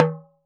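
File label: TALKING DRUM HI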